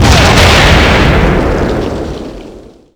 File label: artillery explosion combo; WAR